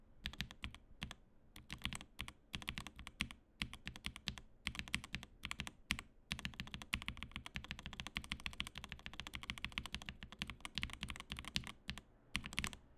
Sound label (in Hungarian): Milky Yellow Pro, kapcsolok; avax gateron kapcsolok